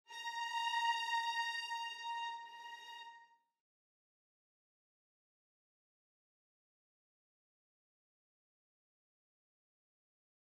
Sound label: Violins